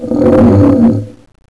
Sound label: bump; quake2; morticus